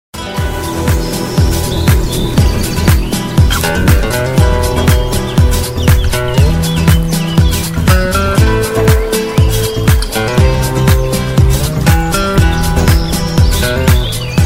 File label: Birds guitar, ringtone